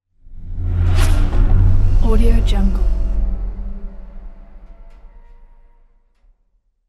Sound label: teleport